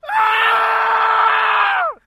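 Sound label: Death Screams Male, battle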